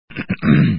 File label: Throat